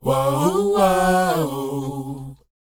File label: WHOA C#, Vocal, R&B & Dance; ILIO; Vocal Planet